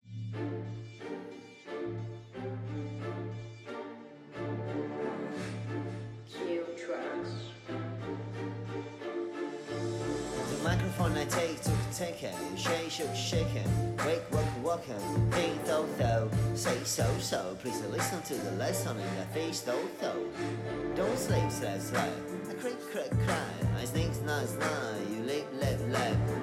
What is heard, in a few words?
rap song cantata